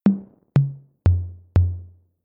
Electro Tom, Rhythm

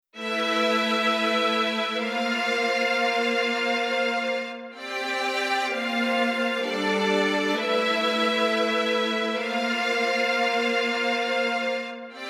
Strings

